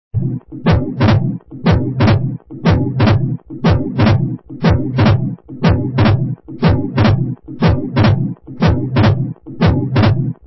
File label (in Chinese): 开瓣音; XinFeiTingZhen